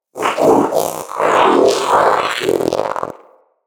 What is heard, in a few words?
Companion Vocal Idle Growl, Pets; Zanuka